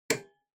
TV Hardware Switch

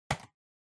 PC Mouse C Hard

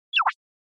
Motion Pop

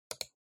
PC Mouse R

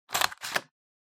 Hole Punch (Punch)

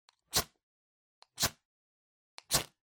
Flint Stick Strike

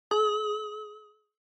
Single Accent Low Short

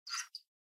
Portable Stereo Driving Noise CD Seek

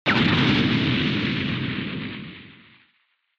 Retro Anime Explosion High

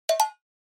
Percussive Accent Fast; 打楽器アクセント

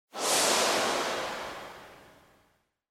Scene Flashback Mid

